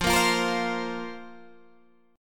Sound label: F Suspended 4th F, Guitar Half Step Down; Fsus4